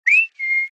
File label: Whistle